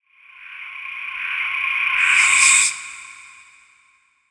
Swell Shrill Reverb, Production, Element, Swell, Shrill, Reverb; BlastWave